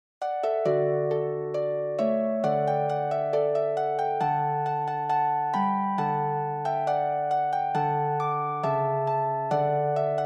harp

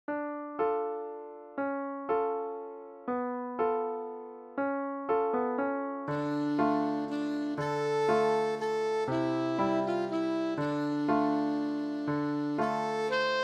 alto saxophone solo; scarborough fair

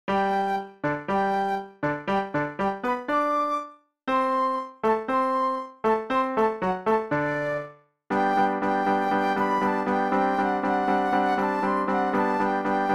flute solo; eine kleine nachtmusik

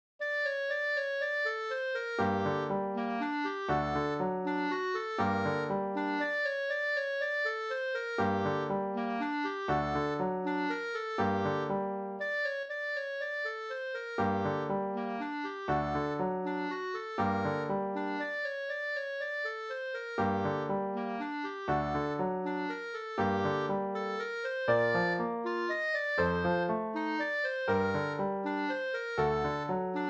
clarinet

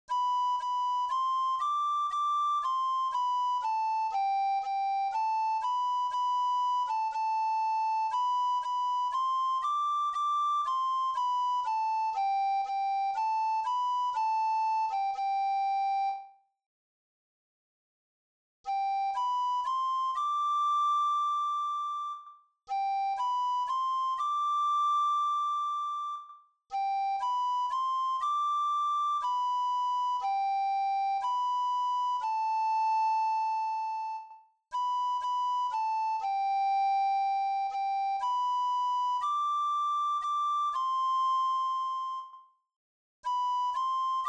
five note recorder

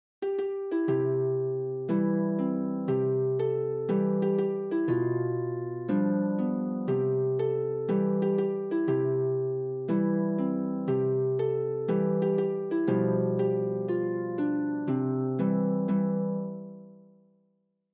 hes got the whole world harp

